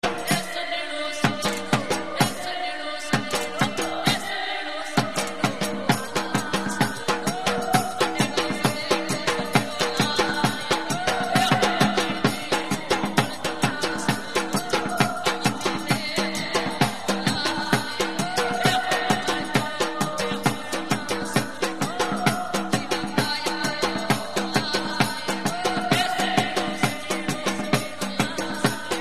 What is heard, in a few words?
gujarati sanedo